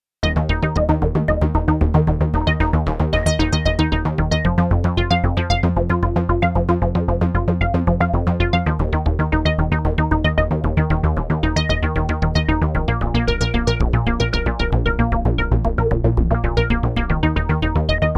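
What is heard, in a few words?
Arp tweaking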